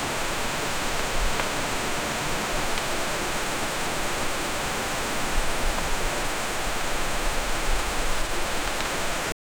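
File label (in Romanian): vânt puternic de iarnă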